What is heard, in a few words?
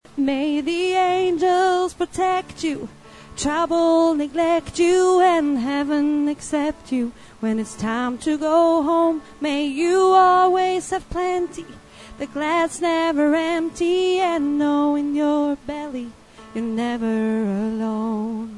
tenor